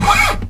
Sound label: NYL SCRAPE, GUITARS; Synclavier Sampler Library